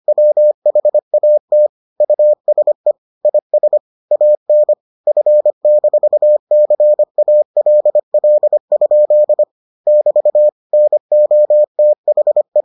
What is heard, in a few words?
morse